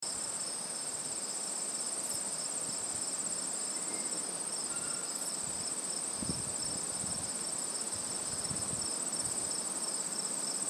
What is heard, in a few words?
Crickets at old Feldtmann Tower site; Isle Royale; Siskiwit Bay